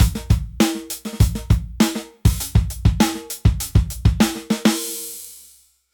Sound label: Steel City Break bpm100, drum breaks; concrete knock drum breaks vol